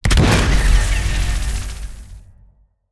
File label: mag shield